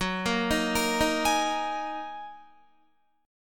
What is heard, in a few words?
Gb 6th Add 9th Gb down Guitar; Listen to Gb6add9 arpeggiated